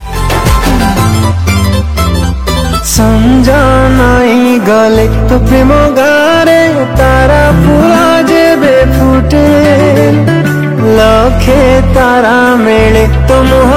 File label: ODIA SONG